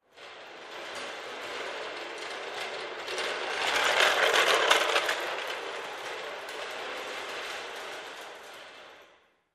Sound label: Hand Truck Trolley Passing by